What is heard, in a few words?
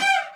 String Shots